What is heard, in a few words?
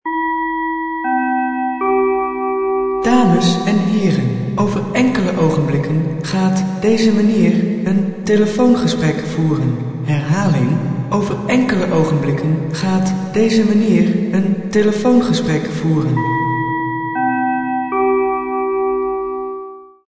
station phone